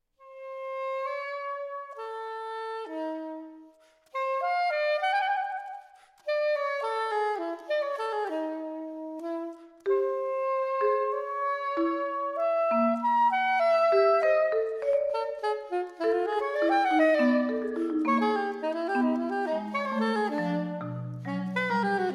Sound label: suite for saxophone and percussion, allegro moderato